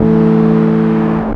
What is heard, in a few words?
Dist Harmonic One Shot EA, Bass; Bass X